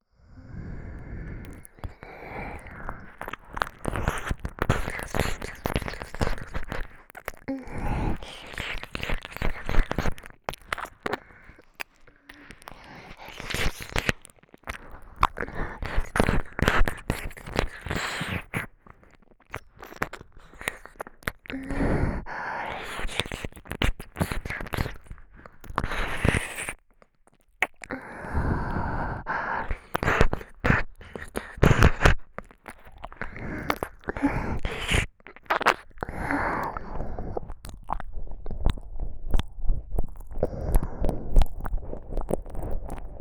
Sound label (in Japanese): 唾液 で じゅぽじゅぽ♪ お耳 とろとろ 舐め, ASMR